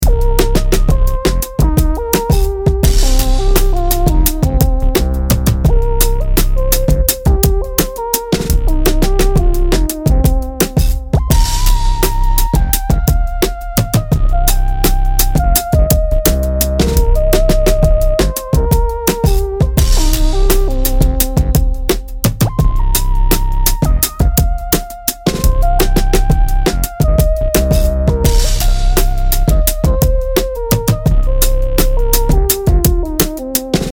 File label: minor pentatonic melody